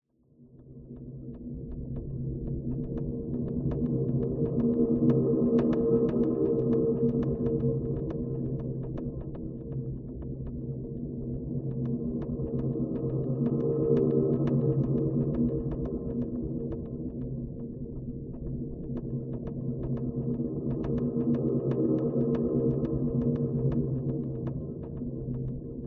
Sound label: science fiction drone tapping dark tense; zapsplat